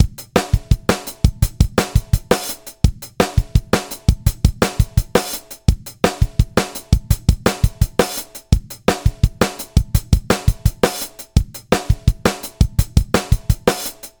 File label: Limiter Beat hard pump; HOFA IQ Series Limiter V2; 50